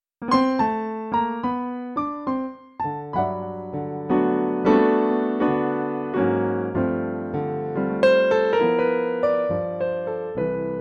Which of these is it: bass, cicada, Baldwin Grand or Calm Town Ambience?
Baldwin Grand